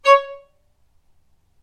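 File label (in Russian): звук ноты до диез вторая октава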